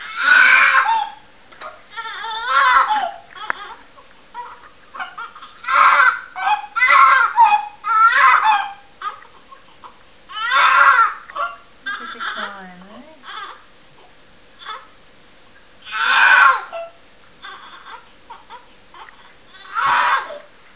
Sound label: baby